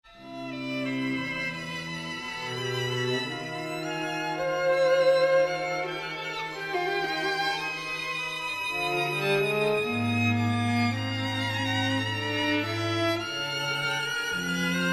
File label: string quartet; rathaus; no. 5 mvt. ii